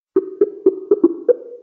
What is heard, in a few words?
skype call connectin; ringtones